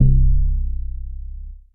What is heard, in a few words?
Upright Synth Bass; Ableton; Factory Packs; Beat Tools